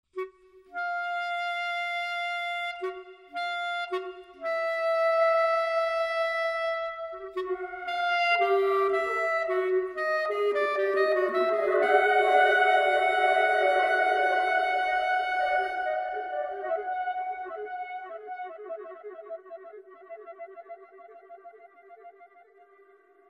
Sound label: clarinet